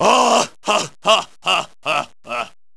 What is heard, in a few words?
laugh; sagat